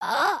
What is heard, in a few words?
stretch, emotes